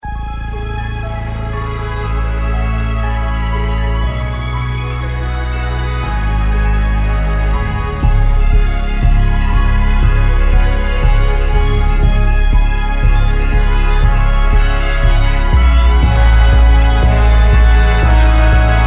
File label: original score